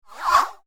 Platform Game Hoop Sound; Video Game Sound Effects; electronic sound effect

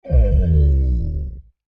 Creature Large Moan Dissappointed; Monsters; Horror; BigRoomSound

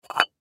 Clay Pigeon Pick Up; From Stack; Miscellaneous Sports; SoundEffects-Blastwave

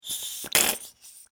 Food Ketchup Bottle Slurp Suction; Household

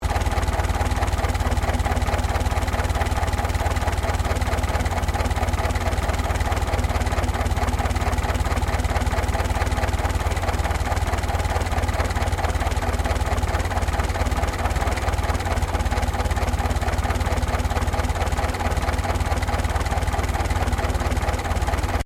Truck Chevrolet 1926 30cwt Idle Engine Loop; Classic comercial vehicles; Trucks

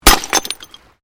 Glass pane puncture; Material components for constructing more complex sounds

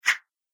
Kung Fu Action Swish, combat swing sound effects; Whoosh; Andrew Fleming

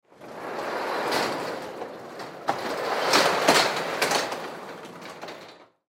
Semi Truck Garage Door Open; Manual; Trucks; INDUSTRY; Blastwave

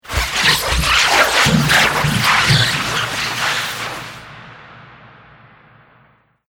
Alien Slurpy Atmospherics

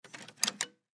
Door Glass Handle, Door Glass Handle Turn; Miscellaneous Doors; Office; Blastwave

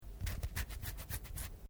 MALE SCRATCH ARM; Miscellaneous Human (Sound Effects:Human)

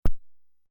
Sony Tube Tv Low Click, Electric click / tick; LYN095 package of Noise & Static

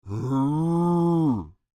Creature, Human, Moan, Med; Groaning; Sound Effects:Voice; Big Room Sound

